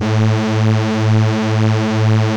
saw lead synth; Ueberschall; Techno Trance Essentials

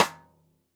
xstick snares on; JazzFunkKit

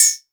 vermona drm1 mk3 hh

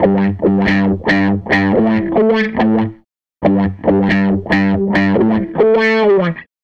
Les Guitar Loop

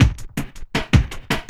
LOOP, 160 BPM, Drum & Bass

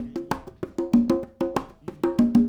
Congas, 70s Analog Funk

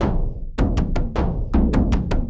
Frame Growing, Frame _ Kendang; Sonic Foundry (Sony Creative Software) - Friendly Fire; Drums

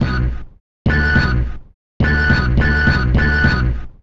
Lo Fi Spy Tones; Sonic Foundry (Sony Creative Software); Stylus Pressure Urban Grooves on Digital Wax

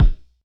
Thud Focussed, Kicks; Airwindows

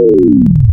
Bell Drop Lower, wavetables; Animoog

